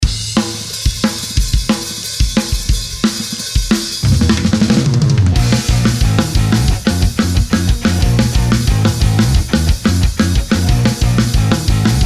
punk drums

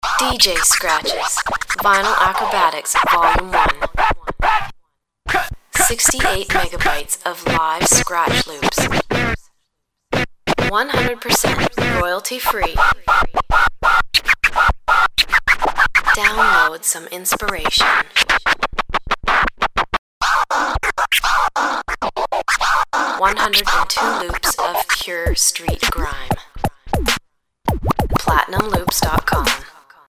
dj scratches vinyl

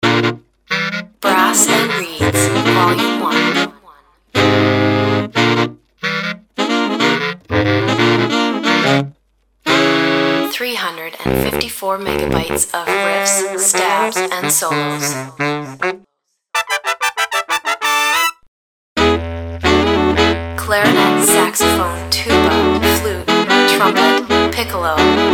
brass and reeds

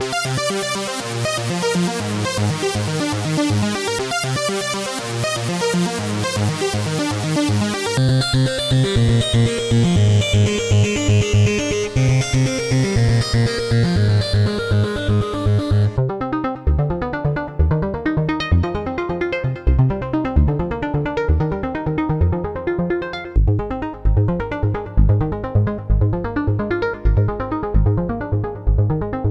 virtual analogue anthemic riffs

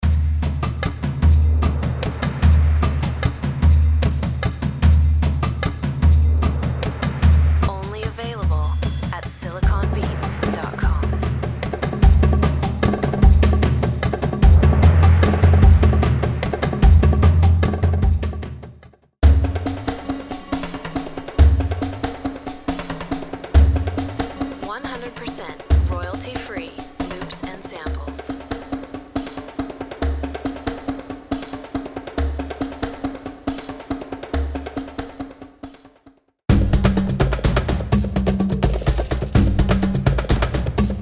tribal drums vo

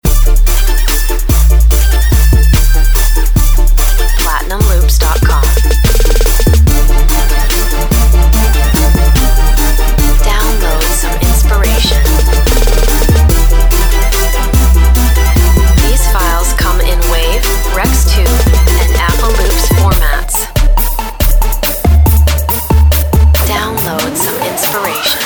dirty south bombs

